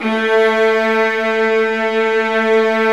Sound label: STR, Vas Bright; Roland L-CD702; Vlas Sect1-2